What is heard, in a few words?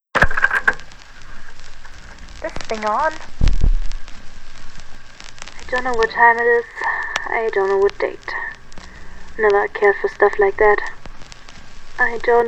Rain Intro